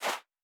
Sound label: Footstep Sand Walking